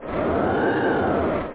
i spin; Chomp3D

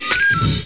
Error; You've Got Mail

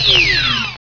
Restore Shield; Loony Labyrinth 1.02 Demo; Arcade

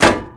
Metal Hits; 1944 Battle Of The Bulge

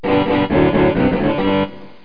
guit axe

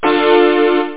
chord; OctaMED SoundStudio V1; jazzies samples

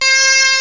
mizmar, arabic

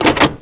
gear off

